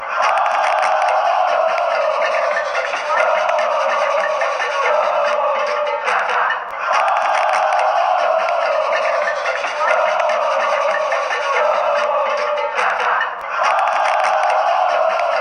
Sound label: oooh oooh oooh, fanchants; plaza